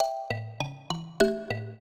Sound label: trio, mbira; Mozart